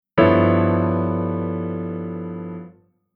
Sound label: Chord Tension Dominant V7 9 11 13